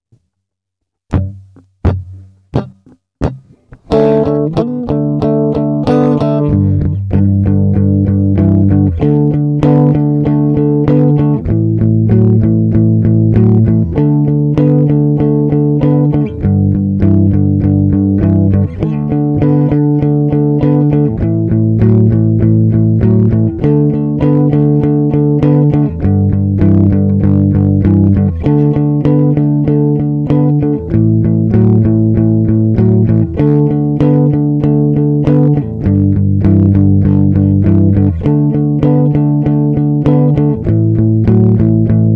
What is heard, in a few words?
4 bar, Pentatonic; pentatonic fun